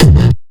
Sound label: bass kick